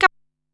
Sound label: "Cap", Korg Wavestation-SR Drum Kit